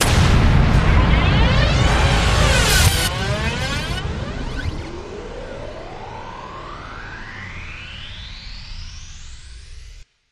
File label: Fly By Sweep